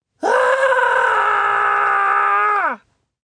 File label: A man screams; Man Screams "Ah"!; Screaming; Voice